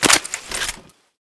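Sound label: Weapon gauss reload; Wpn gauss reloadpt2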